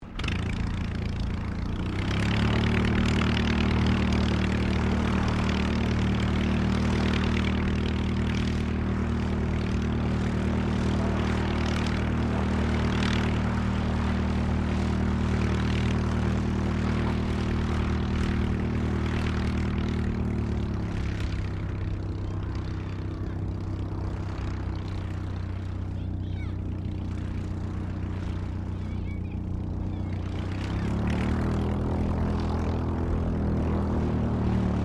Aircraft Dehavilland Tigermoth Taxi Yelling, RAAF Tigermoth biplane training aircraft